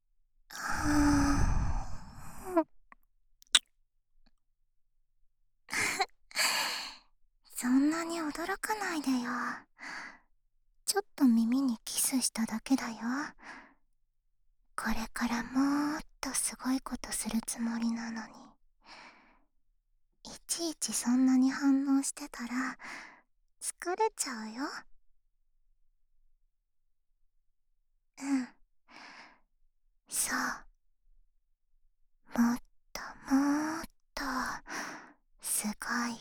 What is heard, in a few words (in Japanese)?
voice only 耳舐め, ASMR; 誠心誠意のご奉仕耳舐め; 効果音無し